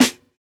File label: Snare, JUNGLE BREAKS